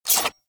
Harpoon Gun Mech; Warframe; Sharkwing; Grineer

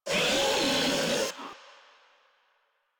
Infested Ancient Player Spotted

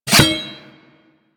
Glaive Catch; Enemies; Warframe; Infested; ZealoidPrelate

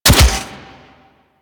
Daikyu Bow Shot 2D C; Tenno

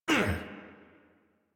Pain, Adult; Warframe; New War; Adult Operator

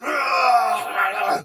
Death Soldier Sword Agent Male, Battle; Dialog; Barks; Melee; Sinecure

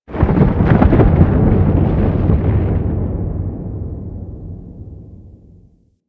Moon Earth Crack Large, Ambience; Warframe; Orokin

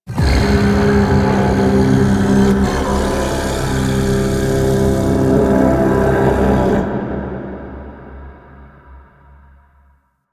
Sand Vocals; Warframe; Man In The Wall

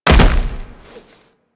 Land Heavy Dirt, Footsteps; Warframe; Tenno

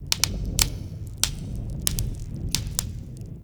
Smithy Forge Fire Crackle; Soul Plane

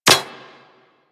Bullet Impact Generic Metal; Surface

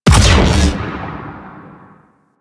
Beam Fire; Enemies; Abilities; Warframe; Corpus; Arachnoid Camper Terra

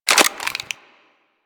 Reload, Railgun; Lanka

